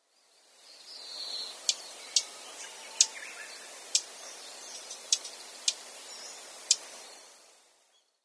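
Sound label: taiga flycatcher, sakata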